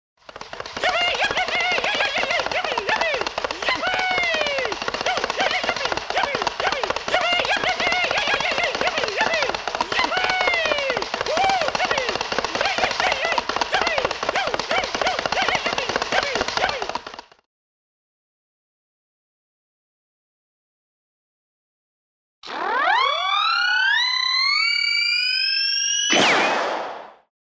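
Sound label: cowboy riding a horse; Стук копыт лошадей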